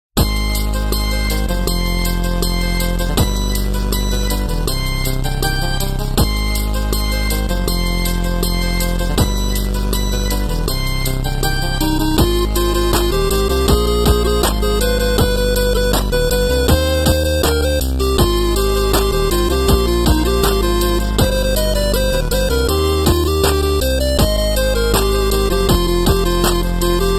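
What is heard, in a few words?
8-bit music for mobile phone ringtones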